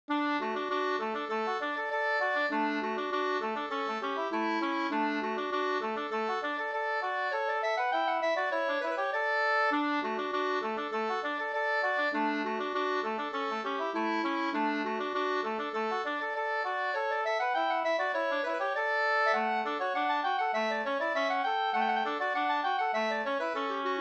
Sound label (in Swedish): engelska